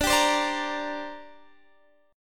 D 7th Suspended 4th Sharp 5th, down, Guitar, DADGAD